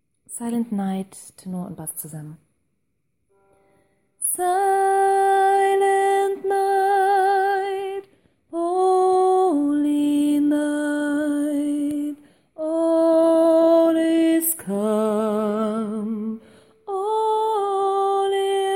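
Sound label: Silent Night Tenor Bass, gospel choir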